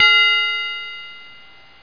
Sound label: CHIME B; Lotus